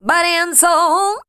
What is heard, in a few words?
voice spectral, ly short